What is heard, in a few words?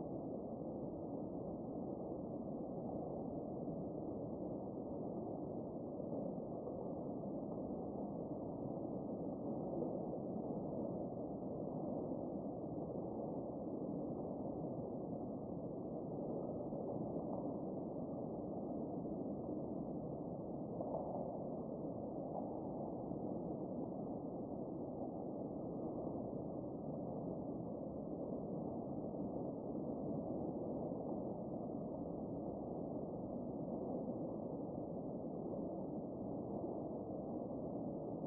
Low Wind Outside; starlight vega linux macos windows gamerip 2015